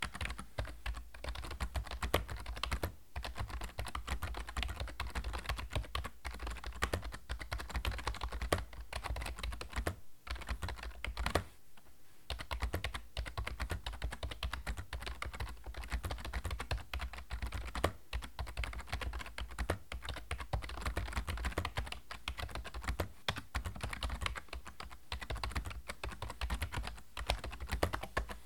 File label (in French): enregistrement son clavier corsair k55 rgb pro xt